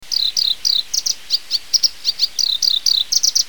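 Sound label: black-browed reed warbler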